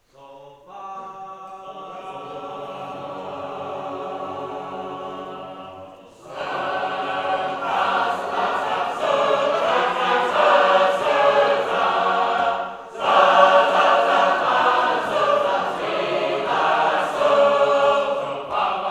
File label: Gospel Trumpet